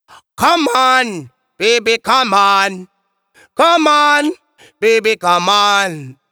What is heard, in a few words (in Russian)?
Come On, сэмпл вокала регги